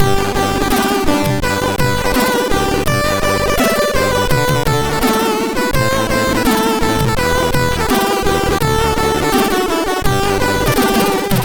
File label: Happy Birthday, MOS6581R2